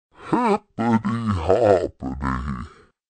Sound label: hiphop